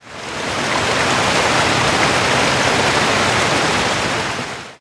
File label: damage vehicle; World Of Warships